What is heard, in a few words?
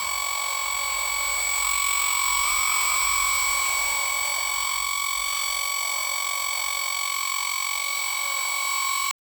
science fiction